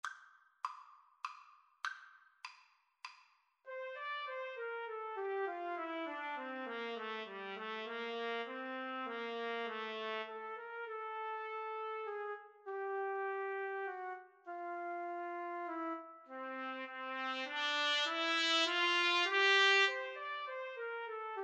Trumpet Trombone Duet

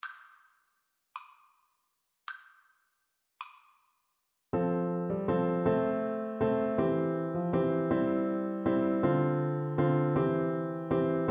mozart sonata, oboe

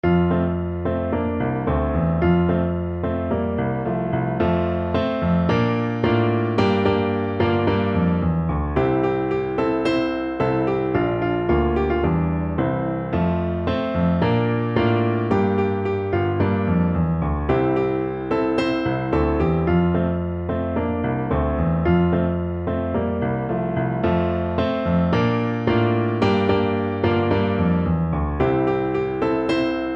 taba naba, Trumpet

